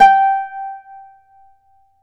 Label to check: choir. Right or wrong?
Wrong